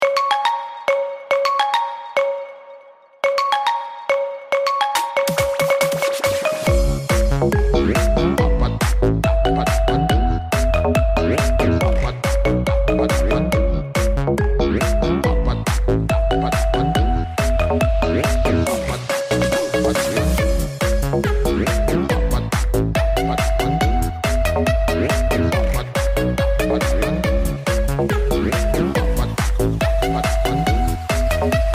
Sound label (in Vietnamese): Nhac chuong Vivo remix